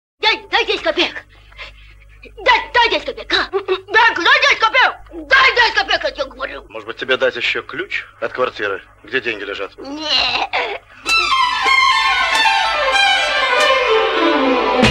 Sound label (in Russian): Увертюра; Музыка Из Фильмов Гайдая